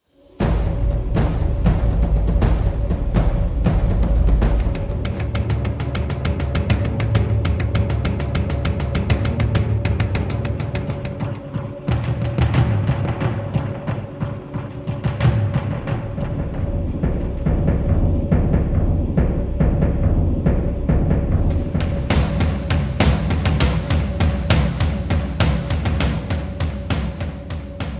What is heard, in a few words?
dark city big drums